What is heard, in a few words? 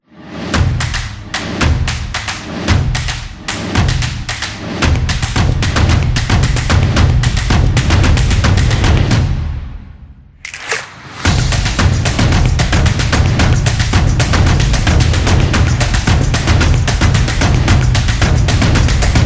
Drum Beat Solo